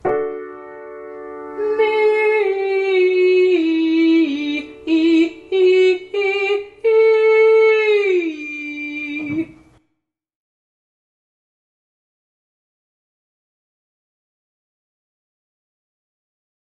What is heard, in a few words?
Legato Staccato Cry Mee Ascending To High G